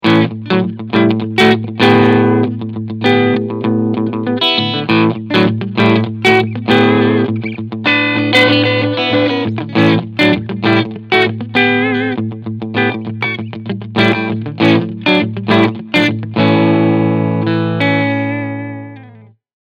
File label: TE 69TL Hot Rod NT Roasted Clean Sound Steg PU Stacked; Harley Benton